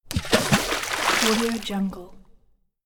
water splash; افتادن چیزی در آب; game sounds

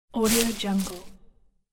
bashing tree branch with leaves; کنار زدن شاخه درخت پر; nature sounds

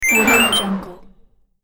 gaming swoosh action; game

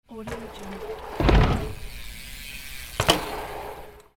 bicycle kicker ramp jumping; urban sounds

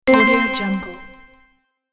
magic ding collect sound

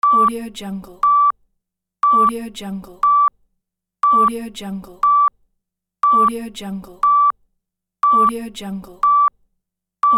hospital heart oscilloscope; بوق دستگاه ضربان قلب

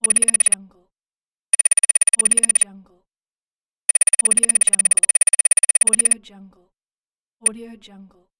counter beeps; interface sounds

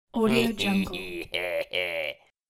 psycho male laugh; خنده مرد روانی; human sounds

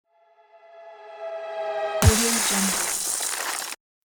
epic downward impact; transitions and movement

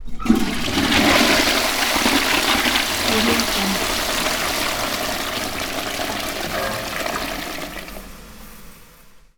dirty public bathroom toilet flush; domestic sounds

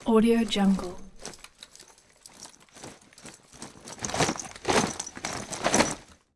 gear movement soldier; cartoon; دویدن سرباز با تجهیزات

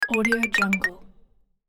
mobile ringtone accept; interface sounds

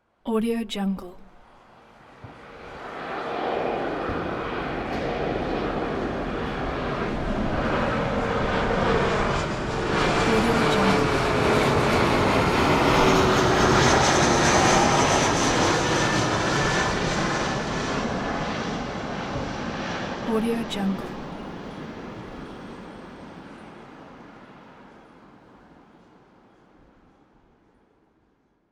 passenger jet flies overhead; پرواز هواپیمای مسافربری

